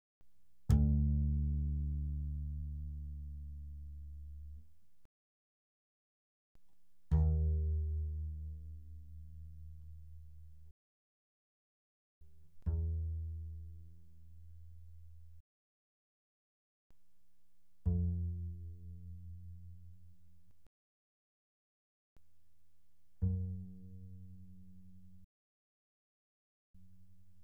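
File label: Bass pizzicato mezzo forte sul D D2 B2, doublebass; Strings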